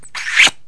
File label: kiss